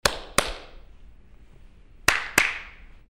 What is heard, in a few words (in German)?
Klatsch, Binaural